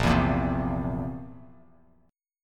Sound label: Bb Suspended 2nd Flat 5th Bb down Bass Standard; strummed